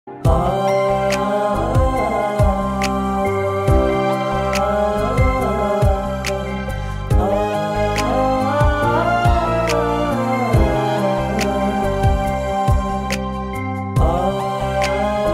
ost ringtone pakistani drama ringtone bgm instrumental ringtone